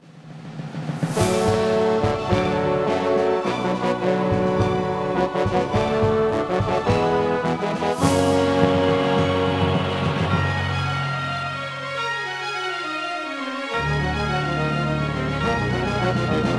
music victory; wh40k

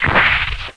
LAUNCH; Gunlok; Robots

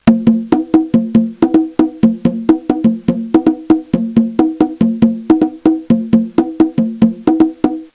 conga; Pinnacle

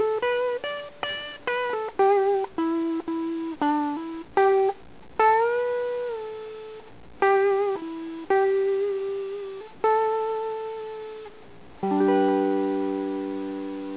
guitar

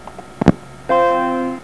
Start Up Sound

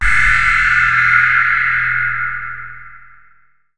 station field start; Earth and Beyond; EA Games

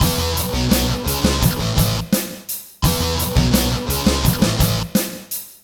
ROCK PARTY; GROOVES

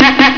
Car horn; TinyTown

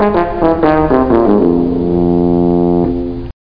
post trombone; How Many Bugs in a Box

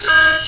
Siren; orionpro

